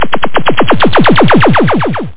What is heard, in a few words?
Lazer; Ultimatum